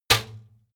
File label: trash basket metal hit hard